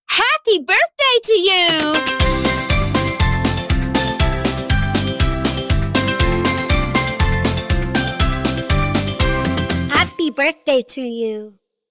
Happy Birthday To You Ringtone

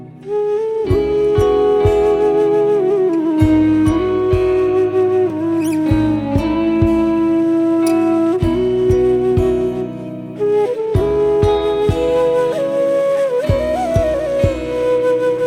Instrumental Ringtone

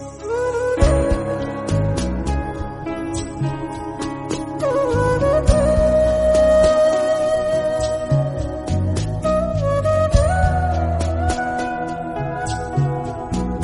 Flute Ringtone

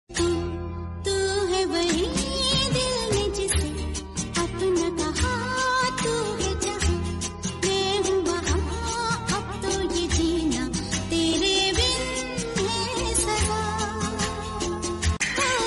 Ringtone, Female Voice

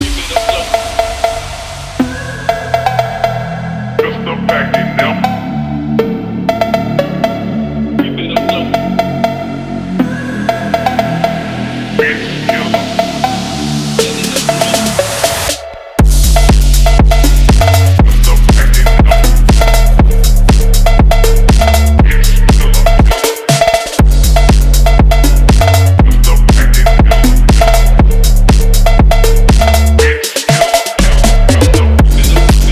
Phonk Music Ringtone

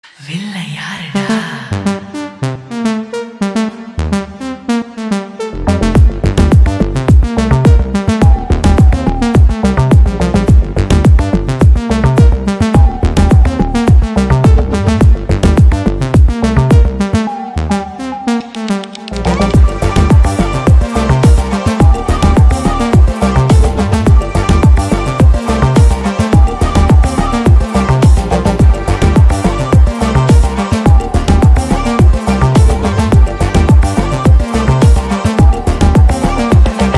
Bgm Ringtone

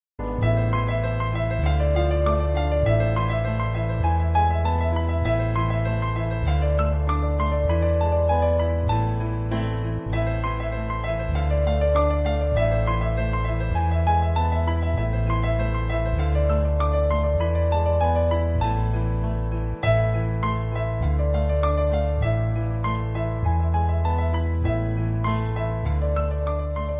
Bgm Ringtone

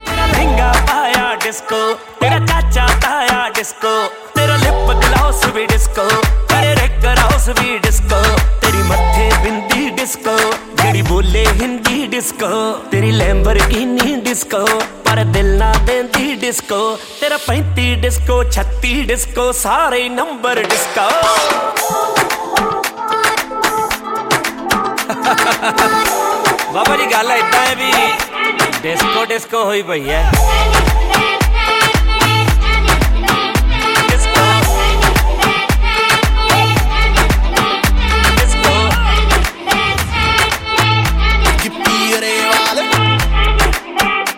Disco Song Ringtone

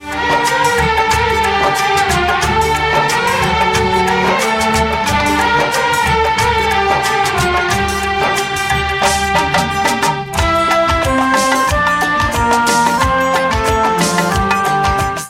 Music Ringtone

